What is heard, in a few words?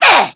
pain